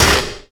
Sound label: radio impact lg, metal; physics; Counter-Strike Global Offensive